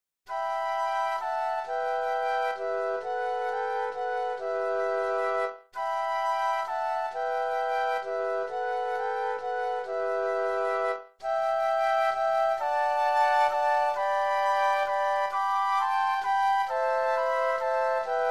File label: Flute Trio; christmas